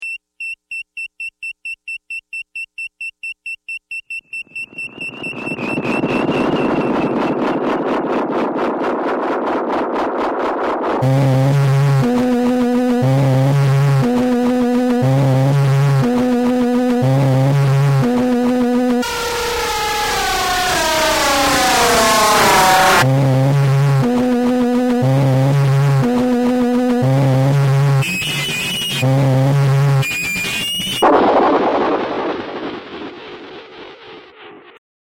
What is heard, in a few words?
super beats